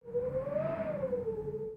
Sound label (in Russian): vetrenij zvukovie effekti